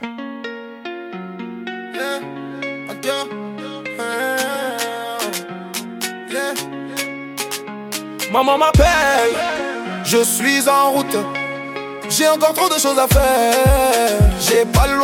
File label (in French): pop urbaine